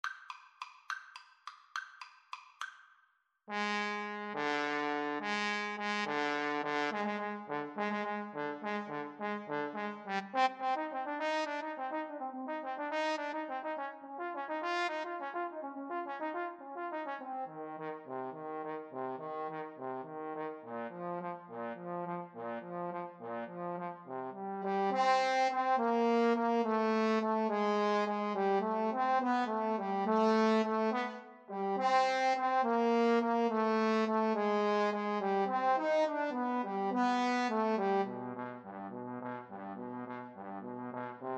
Trombone Duet

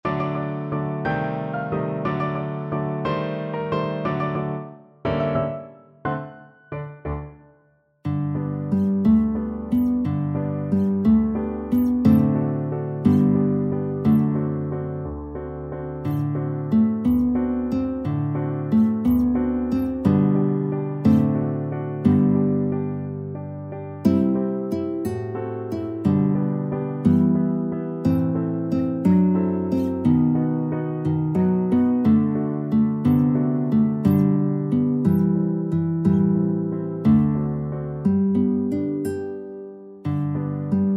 waltz, guitar

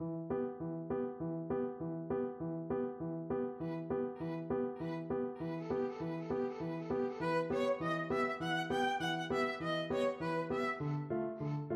violin cello duet, Violin-Cello Duet; chanson boheme

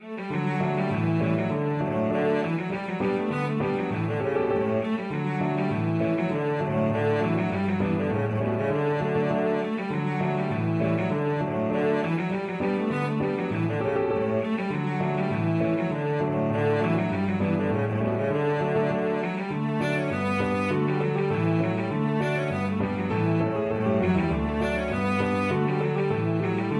Galop, cello; Galopede

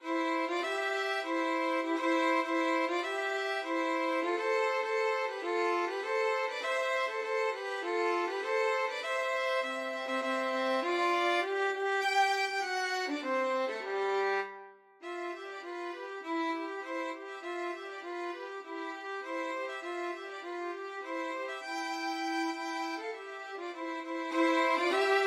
good time violin duet

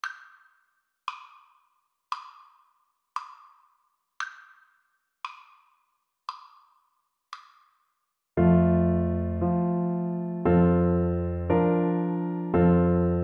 clarinet

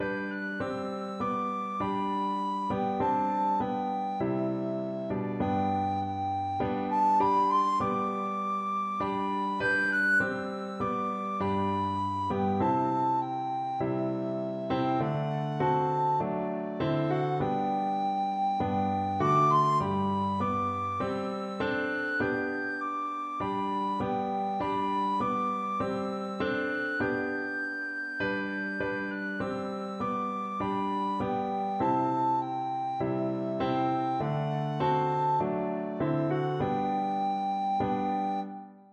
recorder

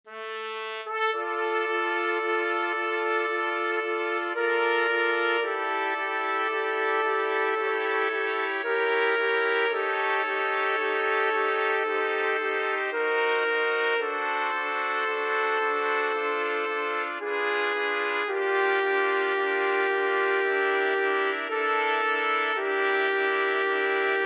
trumpet quartet

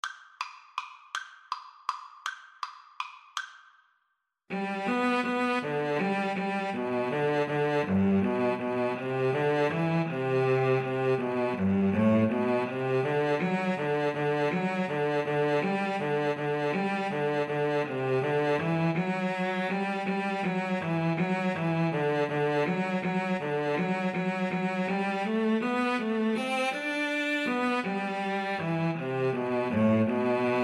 Cello Duet

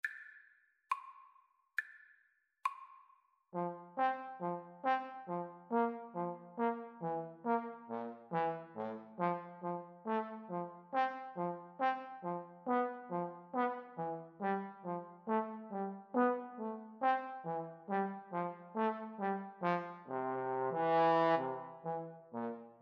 rose, Trombone Trio; schubert

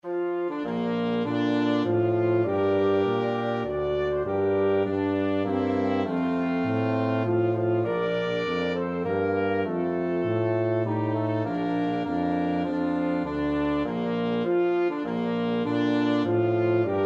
flexible mixed ensemble, 4 players

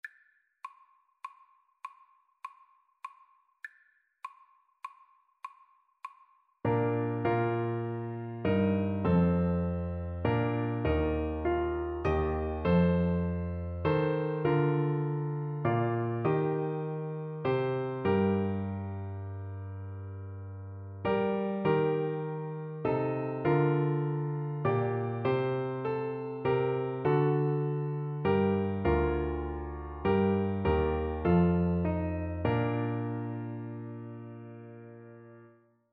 great creator FL kar, Flute

